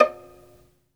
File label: SOLO VI, Synclavier Strings; ILIO